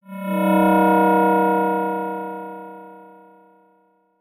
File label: Transition Delay Medium